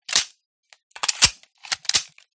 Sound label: deagle reload, guns